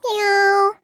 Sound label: meow; monaca